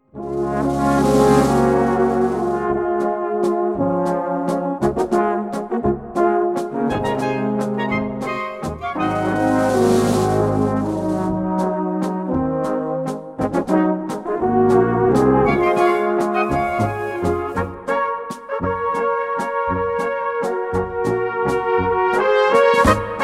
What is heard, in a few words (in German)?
Blaskapelle